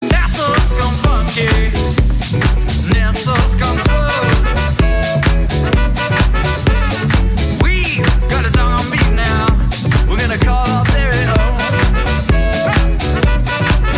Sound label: Funky